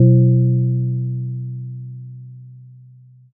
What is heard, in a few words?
gong